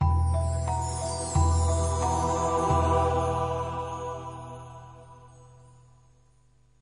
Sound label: Jingle, Opening the Sanctuary; rayman 2